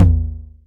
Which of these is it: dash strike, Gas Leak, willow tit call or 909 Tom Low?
909 Tom Low